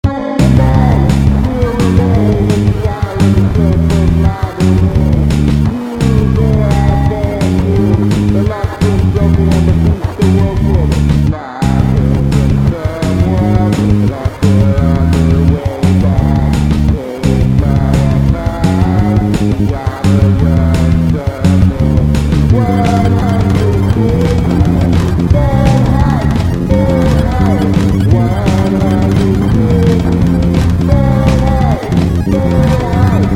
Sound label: Yamaha Rx17; 16 Yamaha Rx17 Rendered